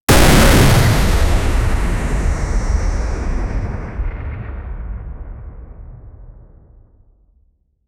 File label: mecha explosion sci fi